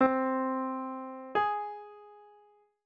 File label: Augmented Fifth